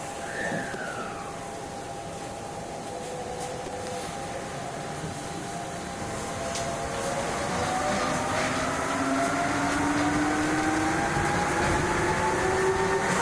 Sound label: go top; tetsuon; monokoza